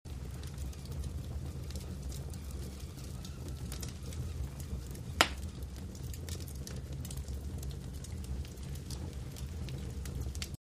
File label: woodfire; Celtic Sounds Of Middle Earth 2